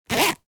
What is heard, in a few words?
Coin pocket zipper wallet; foley; Wallet and Purse